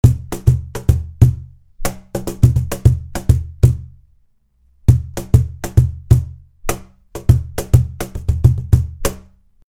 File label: cajon loop 100bpm; creaktor; mozee